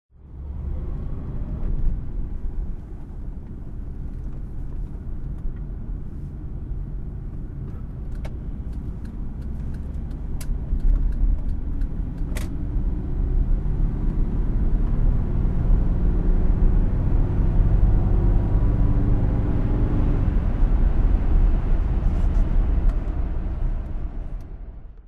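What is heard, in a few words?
jaguar XJS V12 driving, Engine sound driving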